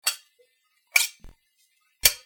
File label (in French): Epée; bruitage; Armes